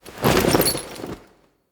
Idle Screen Foley Fast; Kuva Lich

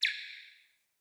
Cardinal Male Bird Single Chirp

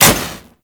Boomerang Ignite; Grineer; Weapons

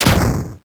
Weapon Liberty Launcher Explosion; Warframe; Caliber Chicks Minigame; Weapons

